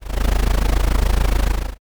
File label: cat purr